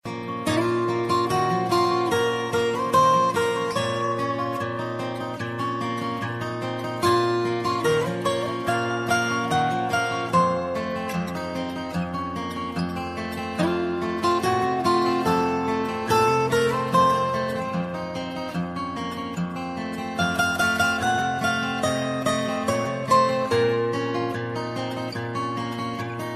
flower violin ringtone

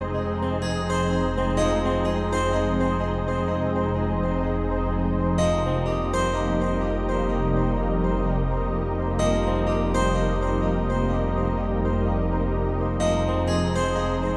iphone 8 ringtone

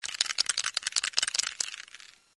spray paint shake ringtone

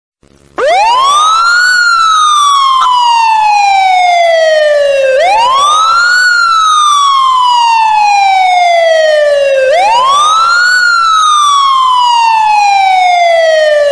ambulance siren background music tone ringtone; iphone ringtones

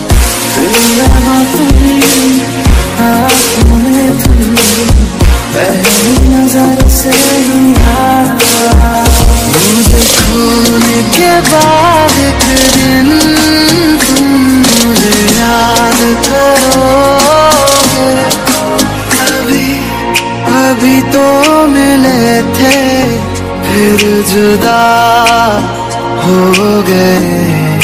fabulous ringtone

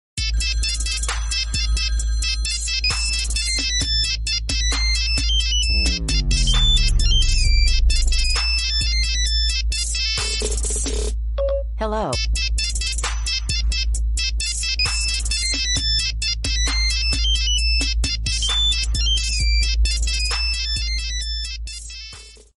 game music mobile tone

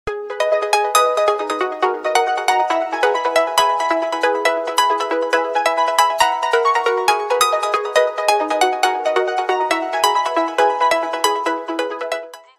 iphone x ringtone

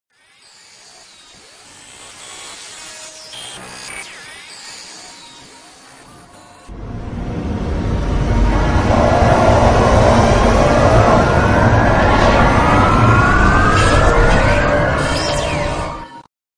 Alienware startup sound ringtone

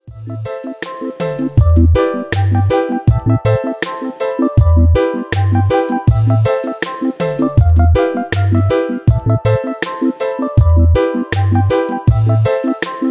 sound beauty apple ringtone; iphone

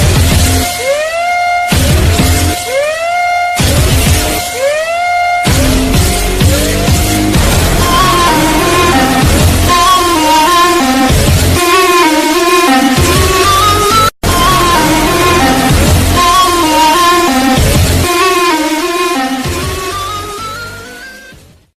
english music ringtone

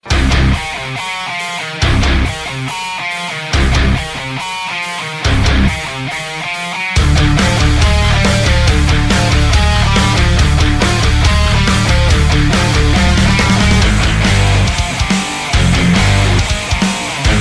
rock ringtone; contra

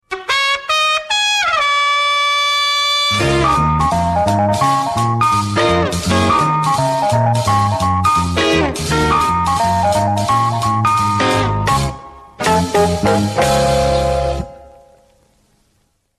cartoon theme song ringtone; gustav cartoon 2018; sms ringtones

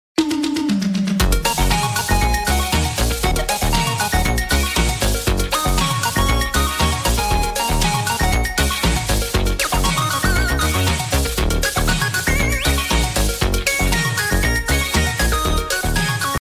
disco ringtone

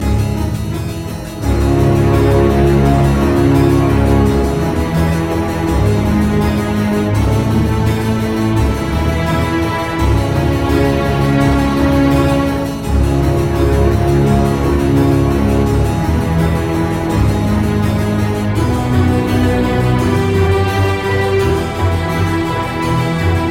horror tone ringtone

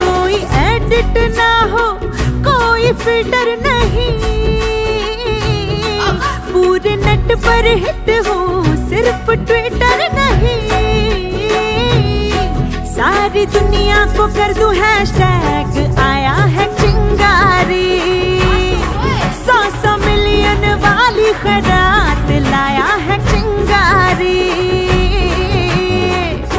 hindi ringtone, bollywood ringtones

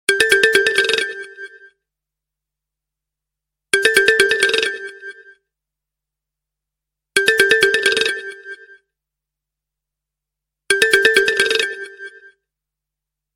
nokia xylotron ringtone

